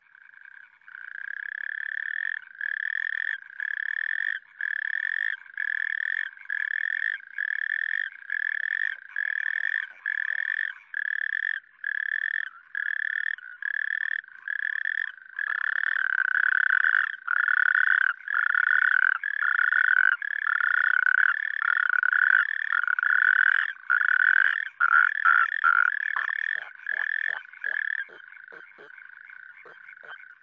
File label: Natterjack toad; amphibians in france